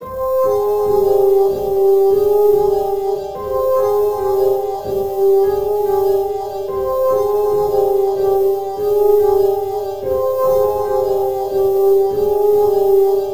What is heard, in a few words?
Synth Flutey Trap C; FTK2